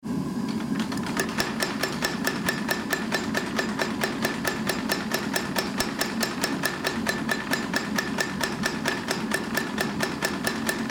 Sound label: small factory